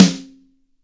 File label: AMBIENCE SNARE